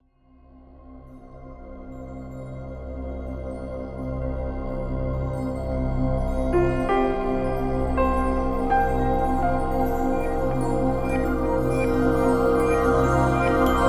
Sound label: rainforests